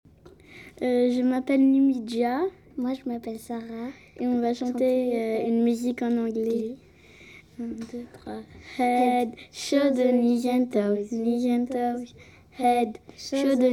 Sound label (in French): duo anglais